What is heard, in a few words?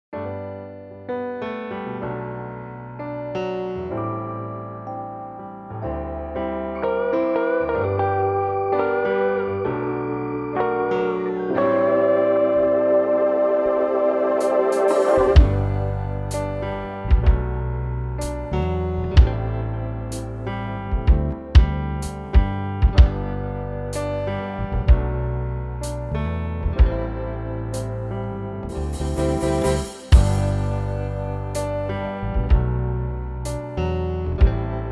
Key Of G major, backing tracks